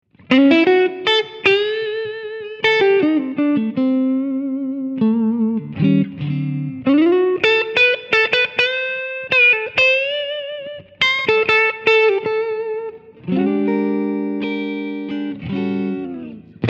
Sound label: Blues Slow Clean